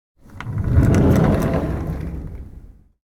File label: door wooden open; anemoi